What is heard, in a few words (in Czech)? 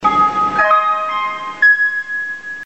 gong pro slepce; praha zastavky